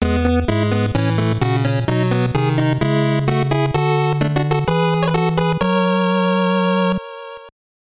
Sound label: Level Victory; Conquest of the Crystal Palace; nes; Zap's Intro